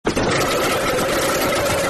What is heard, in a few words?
scary baboon jumpscare